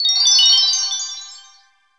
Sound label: Btl Con Heal; The Denpa Men; Android